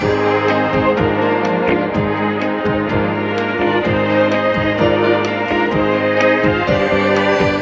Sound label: strings; Phonky